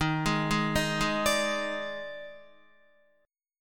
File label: Eb Major 7th Suspended 4th, Guitar; arpeggiated; chord; JGuitar